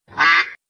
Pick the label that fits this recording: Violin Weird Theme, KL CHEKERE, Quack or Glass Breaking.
Quack